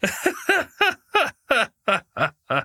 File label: laugh